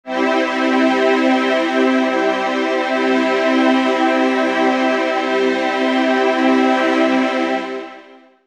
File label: Digi Pads; Jungle Frenzy 1